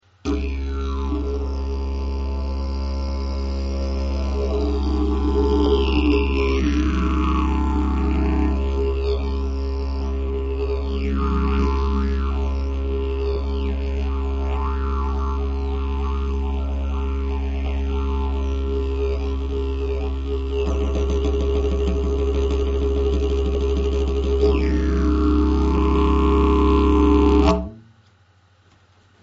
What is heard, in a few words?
didgeridoo